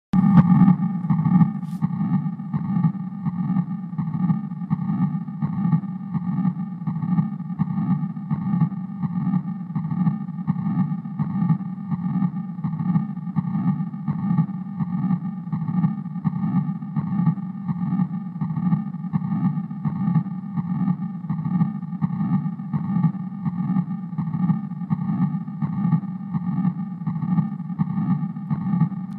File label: Patent ductus arteriosus; simulatorvzorky